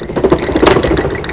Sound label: WING FLIT, ANIMALS